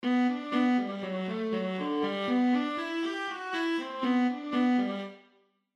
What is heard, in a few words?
Alto Clef Example Viola